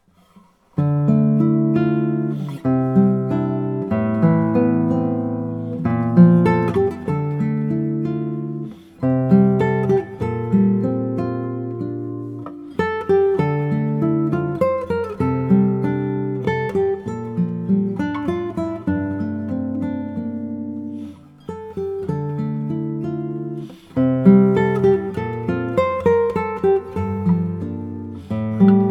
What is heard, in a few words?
Study Ballad